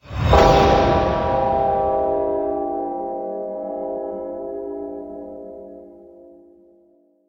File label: bell; axel nosferatu